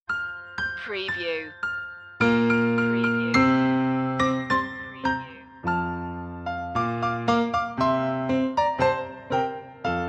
deck the halls piano; media music now

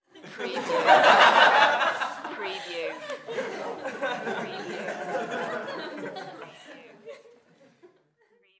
Crowd Laugh Studio

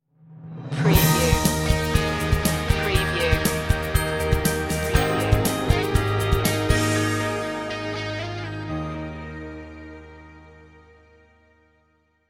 stinger

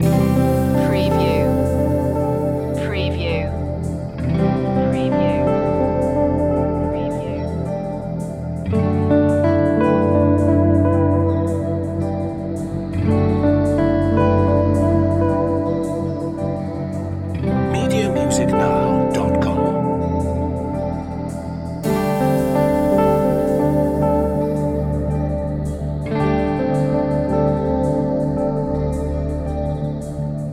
tender ambient loop

